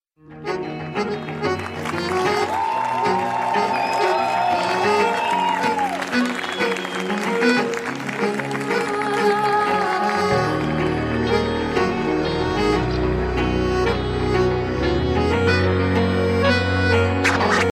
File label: arabic best